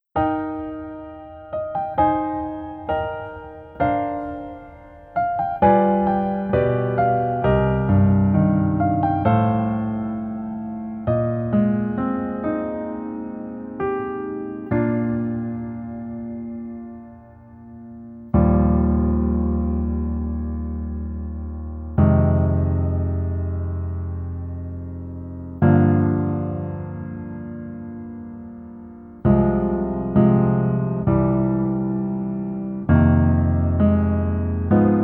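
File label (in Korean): Piano version -1키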